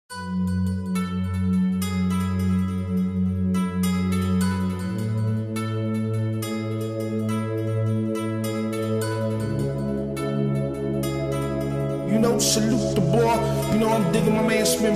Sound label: Nashid Bass